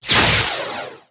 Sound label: Gun, Phaser, Single Blast; gun and weapon sounds; captain audio; fully loaded